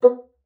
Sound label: B3, bassoon; Xsample VOL-6